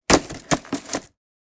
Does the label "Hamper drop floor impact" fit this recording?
Yes